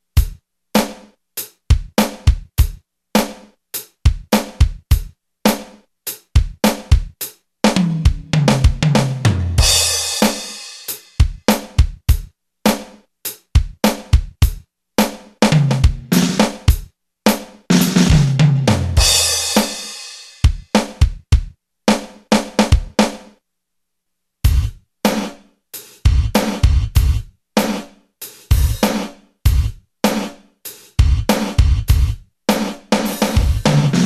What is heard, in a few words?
GATE REVERB